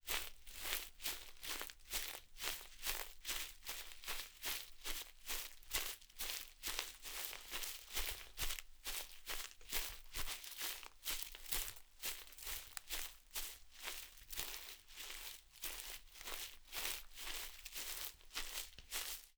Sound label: Walk Grass; Minora-Game; Sound-Artlist